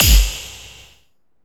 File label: SE GAME BREAK; maimai